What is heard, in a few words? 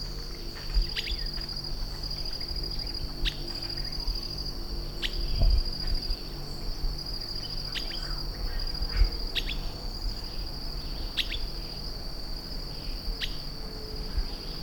Myiodynastes maculatus